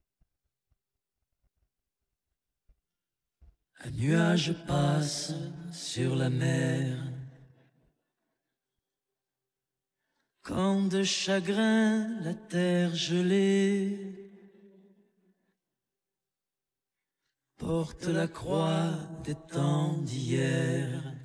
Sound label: vocals; Stems; AI MUSIQUE